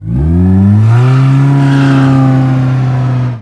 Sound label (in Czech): auto; Mafie 1